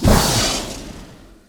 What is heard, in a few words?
deathward attack; witch doctor